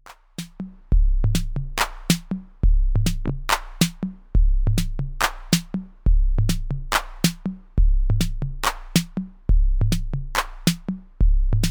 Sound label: System Noise